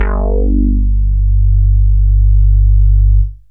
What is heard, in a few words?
synth bass